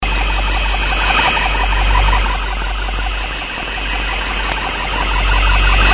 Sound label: bubble